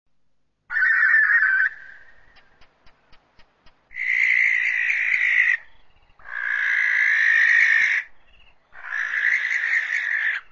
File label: Barn Owl